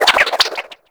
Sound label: Grapple Rope By; Warframe